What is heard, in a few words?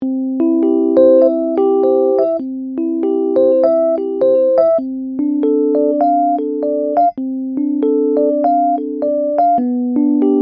Bach Swing